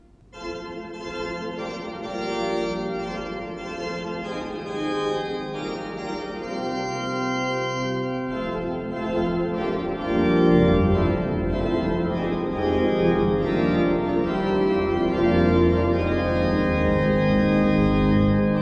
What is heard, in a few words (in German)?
Orgel; Dionysius Gleuel